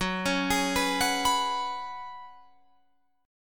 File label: Gb Suspended 2nd Suspended 4th, Guitar, Drop D; 16,16,18,16,19,19; down; chord shape